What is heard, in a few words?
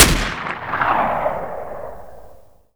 l96a1 fire